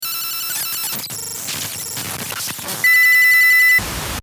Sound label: Data Transmission